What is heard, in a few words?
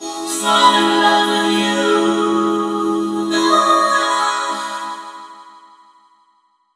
FALL IN LOVE, VOCODER, Funky Vocals; USB Soundscan vol.18; AKAI